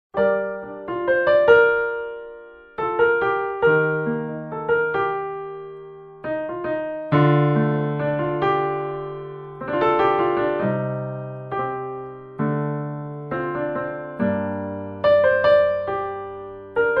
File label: Piano; Piano Comparison